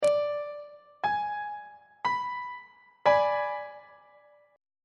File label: a flat diminished 2nd inversion; on treble clef